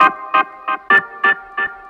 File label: keys, phonky loops